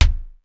lo stomp; sr16 bd

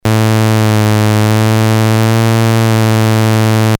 tune; nord

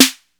snaredrum, tr808

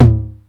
mid tom, tr909

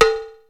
cowbel, rx5

